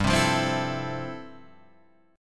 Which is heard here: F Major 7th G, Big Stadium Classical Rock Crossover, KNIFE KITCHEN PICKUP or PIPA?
F Major 7th G